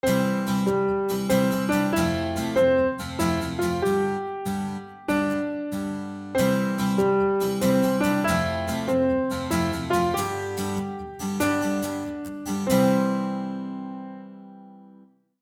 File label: Simple New Happy Birthday Melody; The New Birthday Song Contest